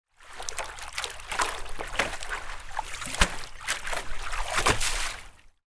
sport surfboard in water movement water splashes calm